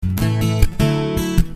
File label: Punchy A,D